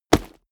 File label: combat cloth punch soft; krieg-waffen; nahkampf; soundpool